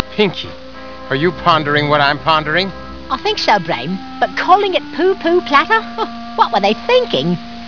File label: platter; Pinky; Brain; I think so Brain, but calling it Poo Poo Platter, I mean, what were they thinking?